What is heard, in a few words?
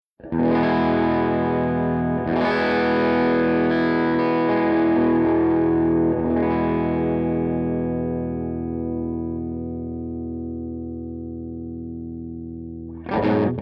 AC30 TOPBOOST